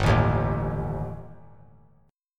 G Suspended 2nd Sharp 5th; Gsus2#5 strummed; JGuitar

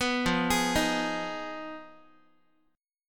F Minor 7th Flat 5th F down Guitar; arpeggiated; JGuitar

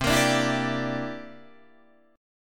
C 9th C down Guitar Open D

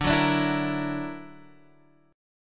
Gb Augmented 7th D

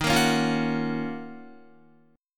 D sharp Minor 9th, Guitar; D#m9 strummed

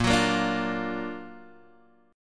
F sharp Augmented Major 7th A sharp, chord

